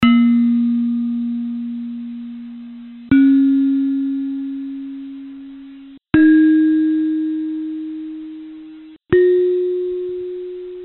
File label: saron, gamelan